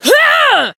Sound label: Voice attack; minalca